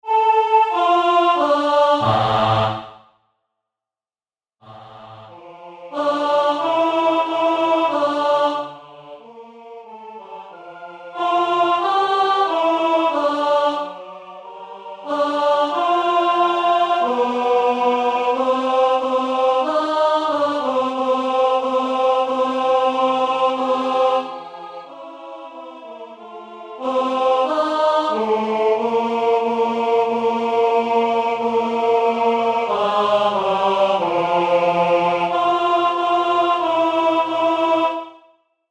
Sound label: Andante Tenor; Salve Regina